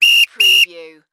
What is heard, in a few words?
Whistle Blow Twice; Sound Effects Bible